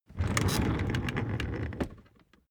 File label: office chair loaded trundle